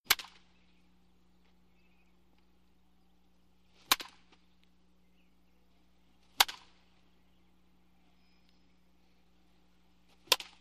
Baseball Bat Wood Me; baseball fx & games

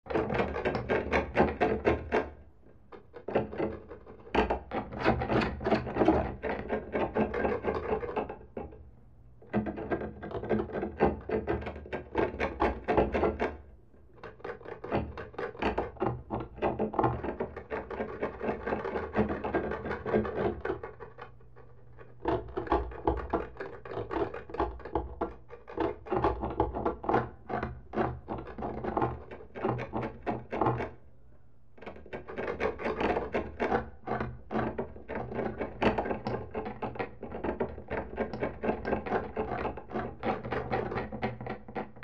Scratching Walls Ee, animal vehicle growls

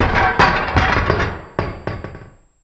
Metal Drop 25 Pounds, gyms

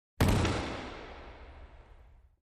Foot Stomps Hard, racquetball

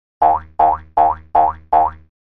Boings Bouncing Boing, Bouncing Twang; boings / twangs / snaps

